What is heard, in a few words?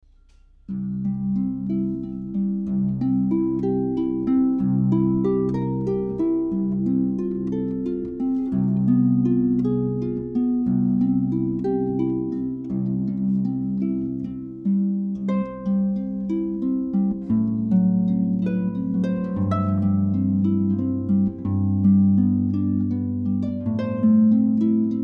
Ave Maria Harp solo